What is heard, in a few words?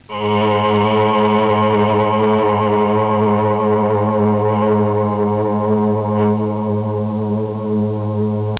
low synthesizer; Sound Effects for Multimedia - Volume 1; electronic sounds